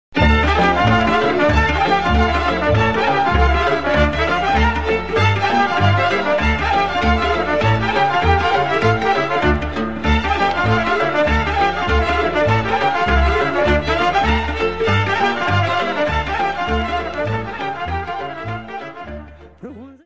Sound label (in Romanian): Hora mare cu Strigaturi, Roumanie